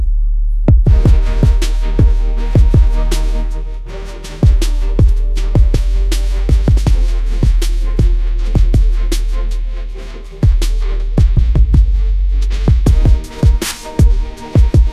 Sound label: cinematic soulful nights, ringtones